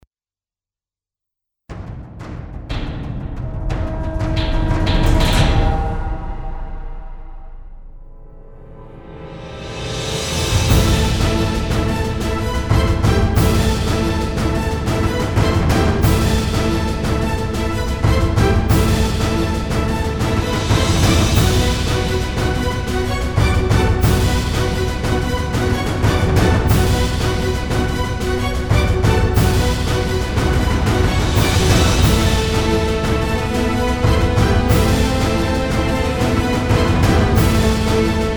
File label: Dramatic Trailer